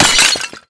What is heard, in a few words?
pot break